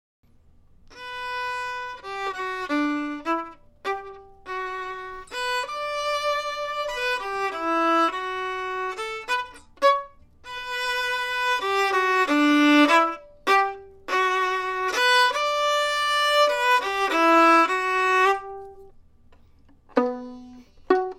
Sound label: Tango Violin